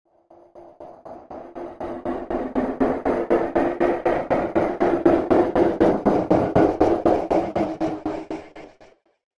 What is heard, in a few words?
Hits Knocking Shop; Hit Sound Effects; horror; impact